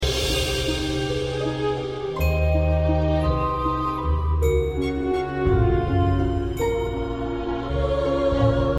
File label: orchestral